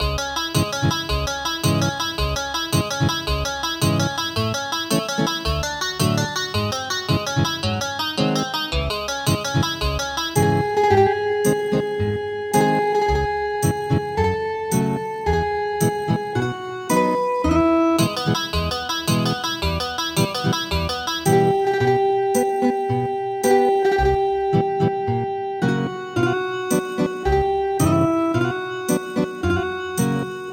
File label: Ethnic Guitar; Moonlight Sonata